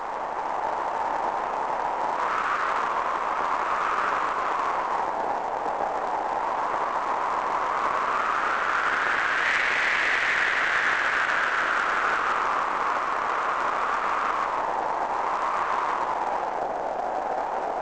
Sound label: wind sea; Pirates Of The Caribbean